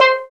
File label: SPD-8, DIGITAL